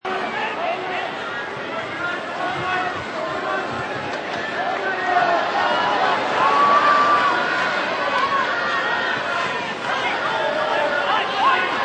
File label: Sport Event Ambience, Arena sporting event ambience.; Crowds; Voice